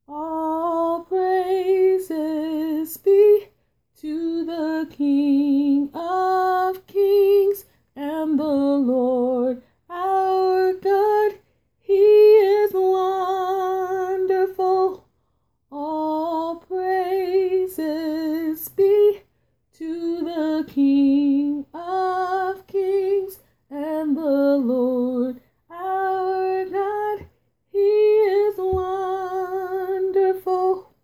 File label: alto